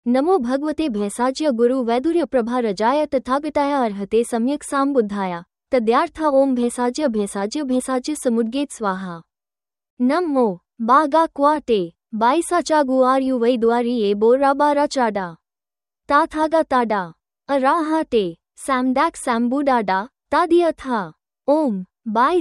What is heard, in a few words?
namo bhagavate; Hindu; Thần-Chú